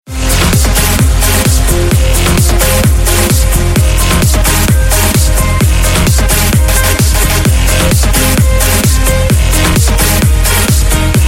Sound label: apple ringtone